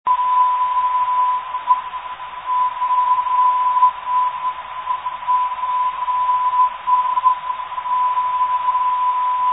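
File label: 597 kHz, non-directional beacon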